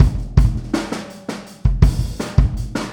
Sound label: Boogaloo, Kick, 82 BPM; Drums; Verse; Sonic Foundry; Drums from the Big Room The Mixes